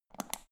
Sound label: power button turn on off quick